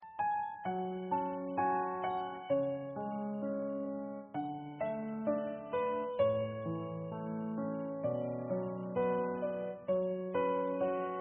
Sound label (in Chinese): 第一段背景音乐